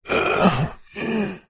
human male man strain grunt pain

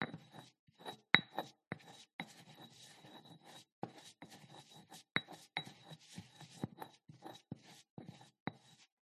household kitchen herbs pestle and mortar scrape

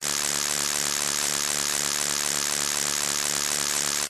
cartoon plane prop high pitched static

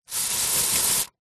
household deodorant aerosol spray close up

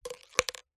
leisure toy marble drop into plastic container

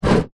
plastic tub slide on top of another short; zapsplat

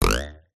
cartoon twang bounce ruler pitch rise

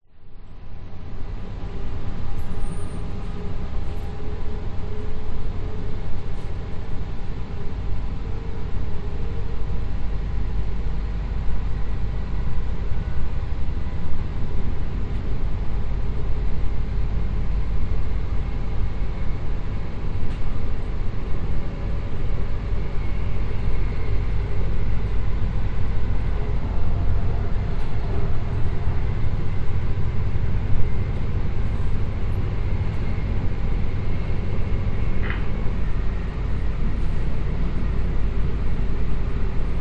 vehicles train internal pov moving qld rail; zapsplat

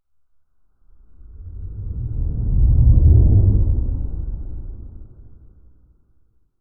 science fiction spacecraft fly past internal pov; zapsplat

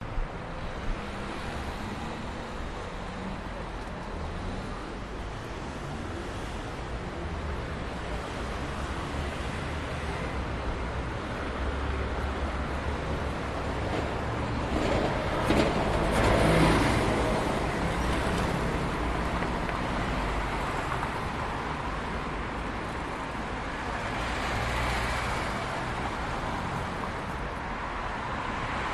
Traffic Sound Cars Motorsounds; Budapest Hungary

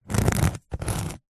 small sharp spike scratch carpet; foley

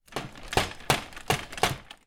bed z bed folded up shake rattle

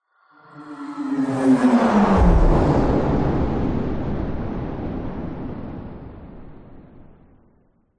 science fiction pass by whoosh into explosion; zapsplat

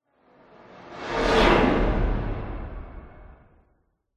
sound design whoosh fast weird scary; zapsplat

